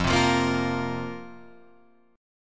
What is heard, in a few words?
F Suspended 4th F down Guitar Open F; Fsus4 strummed; JGuitar